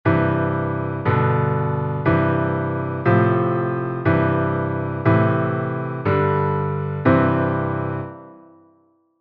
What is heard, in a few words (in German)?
Akkorde mit Umkehrung